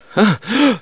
startle, scientist

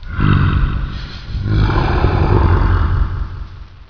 recharge

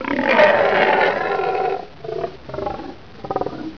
bullchicken die

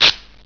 spark, buttons

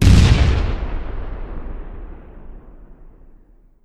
mortar expl; weapons

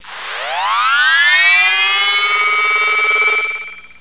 mine charge, weapons

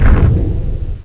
gar step

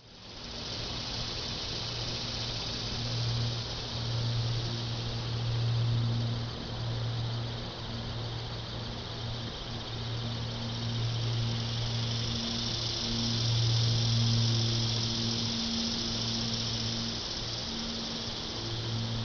ambience

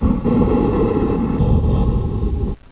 gar breathe

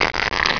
leech bite

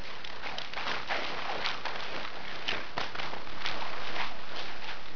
squirm, tentacle

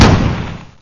gun

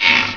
tentacle search

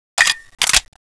deagle reload, weapons

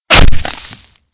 chainsaw hit; weapons

